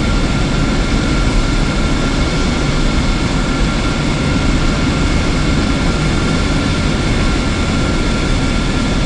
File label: Aircrafts Engines F15 In Engine L; DCS